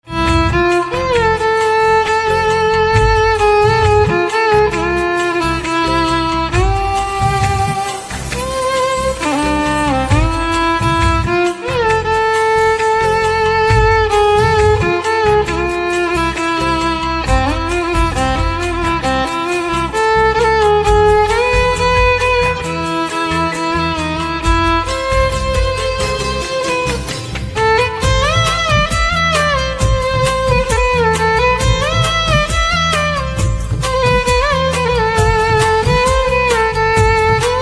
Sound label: Violin